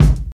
nice bass drum one shot g key, Royality free steel kick drum tuned to the G note.